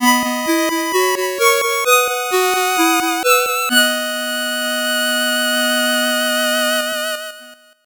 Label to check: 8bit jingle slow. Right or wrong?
Right